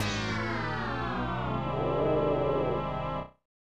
Lose; onita atsushi fmw; snes

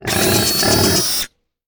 Warrior Death; We Are the Dwarves

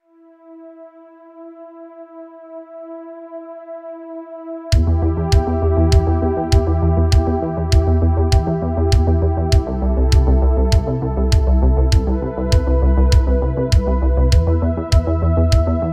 Synthwave Music; Retrowave

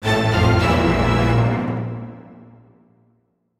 Dun Dun Dun; Super Meat Boy; Digital Special Edition Soundtrack

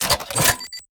Sci-Fi Weapons-Loading Fx

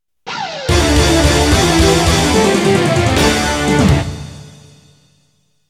Game Over; Reflex; Type A

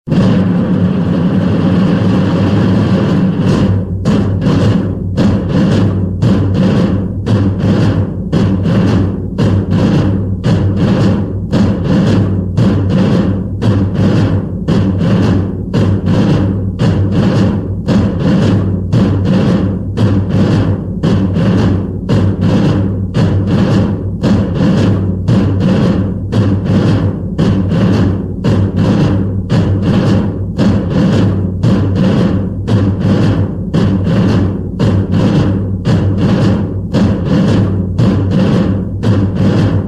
french drums march; ultimate admiral age of sail windows gamerip 2020

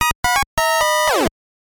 Lose Life

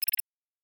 Error

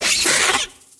insect attack; Insects

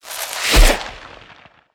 Disappearing; children of morta; Scorpion King

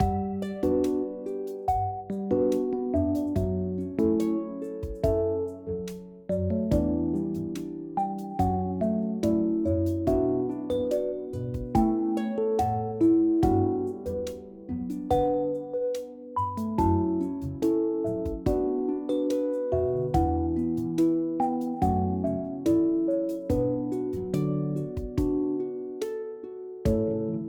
Bittersweet Loop; Girl and Goblin; CR2

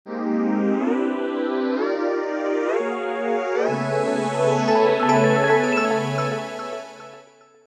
Jingle, Transfer Start

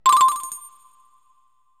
Collect Bonus Token; donkey kong 64

